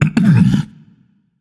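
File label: non-verbal clear throat; smite; atlas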